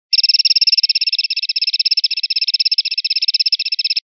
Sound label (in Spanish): rep carretillas, pardillo